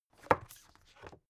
book shelve hard

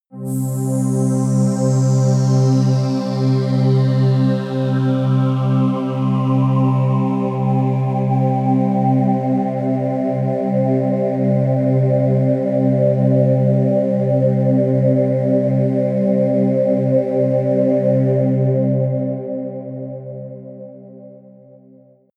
pad sweep hiss; flaechen

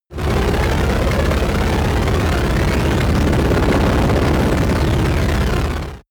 space rotor rocket drive hard; raumschiff

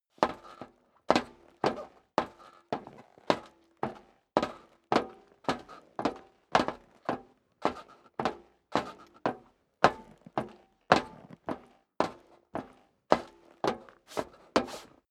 step boots metal dry upstairs sequence; schritte; Footsteps

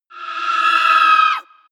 wraith attack; schreie

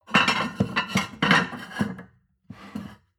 plate on plate place multiple, restaurant cafe

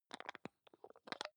hygiene small screw cup open soft; haus-garten; badezimmer

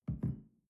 shell place on tablecloth soft; soundpool

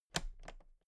toolbox latches close very soft; industrie wirtschaft; handwerk

